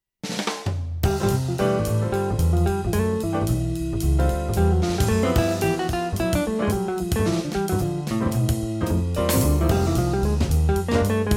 guitar; fp 5 demo

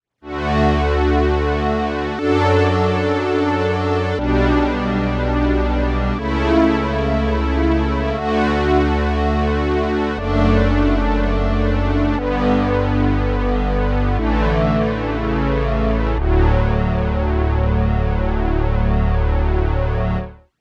String II

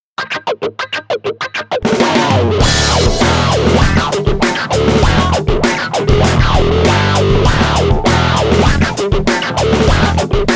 patch demo

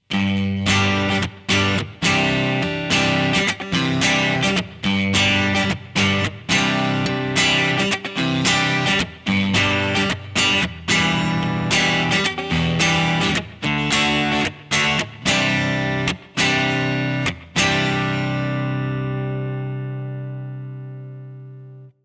ag eg mix

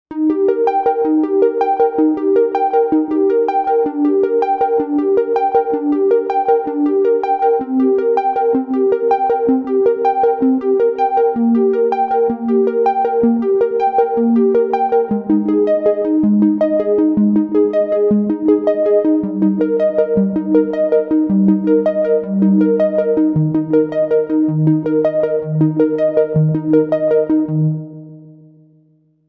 Harp Dreams

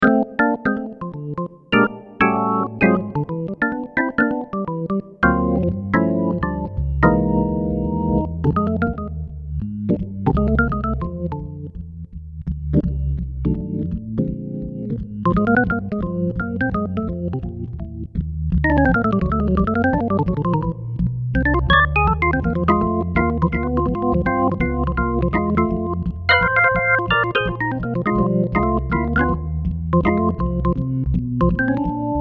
jazz organ

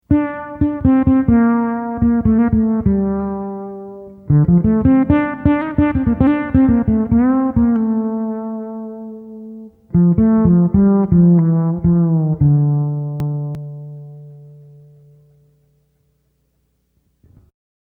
reverb

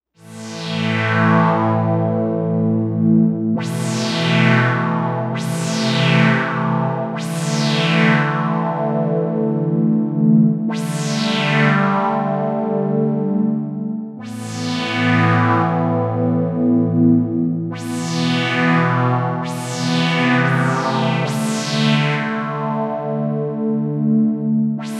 Galaxy Pad

